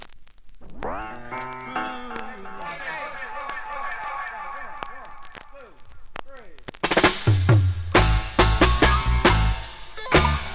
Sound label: reggae sound